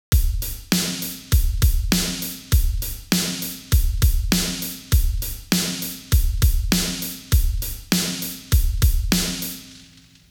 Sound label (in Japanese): 8ビート